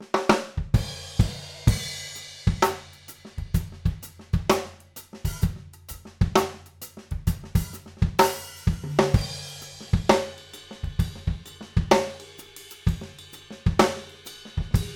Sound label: OH Spaced Card, overheads, drum; drum shootout